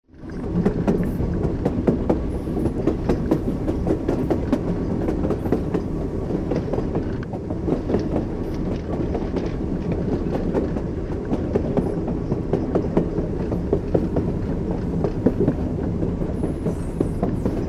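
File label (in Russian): Купе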